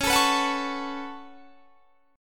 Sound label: Db 7th Suspended 4th Sharp 5th Db down; Db7sus4#5 strummed